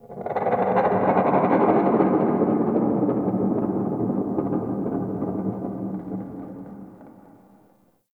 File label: Beast Cry; Warframe; Tau